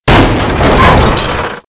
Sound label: Stair Snap; Playable Tutorial Level